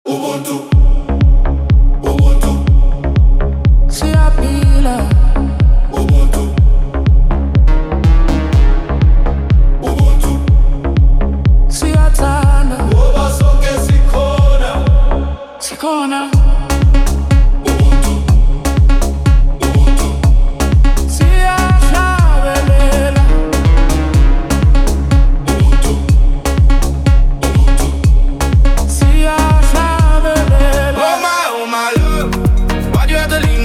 Afro House; Afro House Bundle

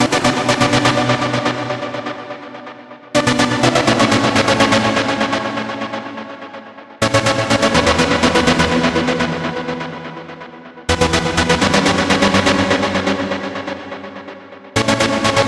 Serum Lead B minor

